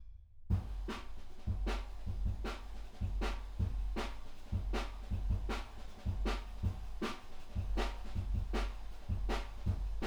ROOM, RIDE CYMBAL; THE RAIN RIDE CYMBAL; RAW MULTITRACKS

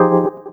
Chords Tones; Equipped Music; Loungin House

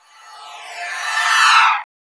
VANISH; Spectrasonic Distorted Reality 1

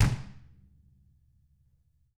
DW FELT, DW KICK

